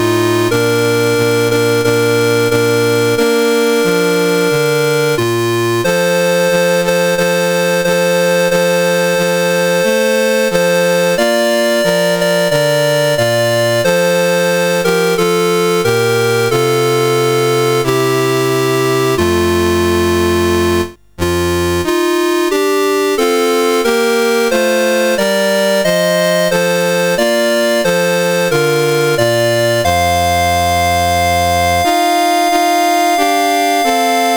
Liberia, National Anthems, CSG 8580

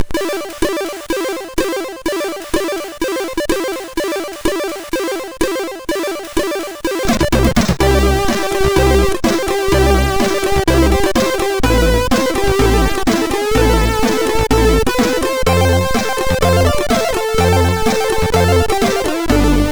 Slow MOS6581R2

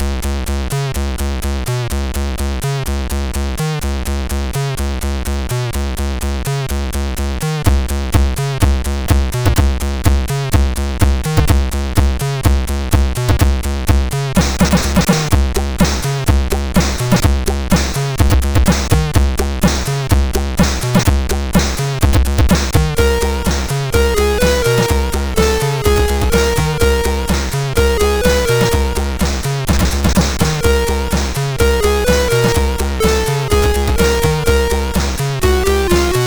Rigid Bass, sid MOS6581R3; Remorhaz

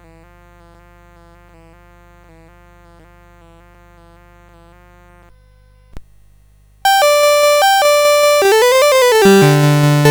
Allegro, CSG8580R5; Allegro 16

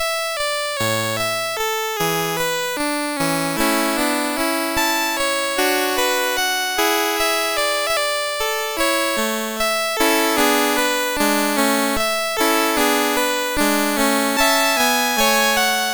Gavotte Gracieuse, MOS 6581; Les Petits Riens

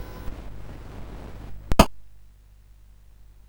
sid CSG8580R5; California Games

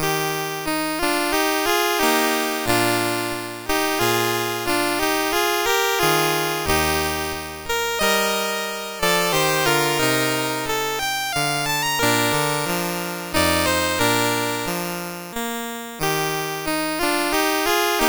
Andantino in E Flat, MOS6581R3

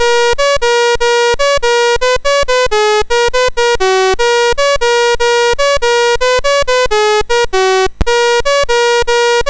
Tune BASIC sid MOS6581R4

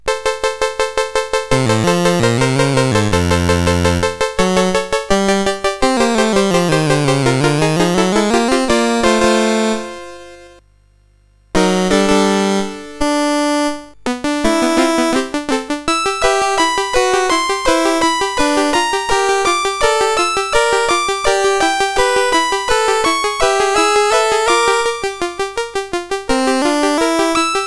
Music Box, CSG8580R5; Eight C64 Games in Six Minutes

